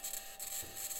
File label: mic, mars2020 edlcam